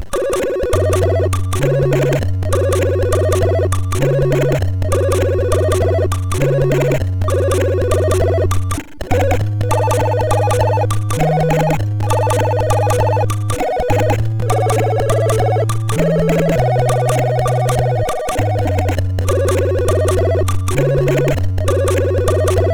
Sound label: sid MOS6581R4; Passing Shot